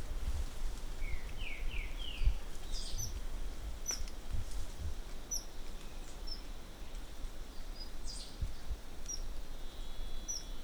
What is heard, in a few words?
Raptor in the canopy, titi monkeys vocalisations; compcog